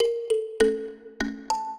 minuet, mbira; Mozart

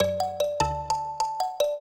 trio, mbira; Mozart

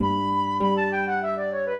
minuet, flute harp; Mozart

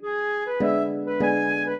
minuet, flute harp; Mozart

